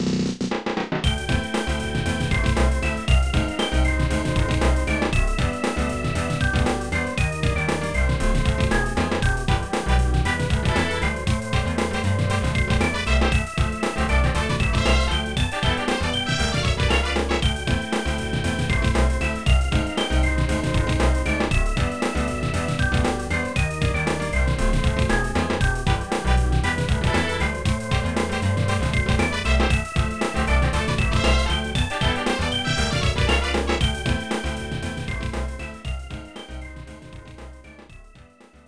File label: Game Won; dear boys; snes